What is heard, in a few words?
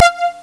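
hall brass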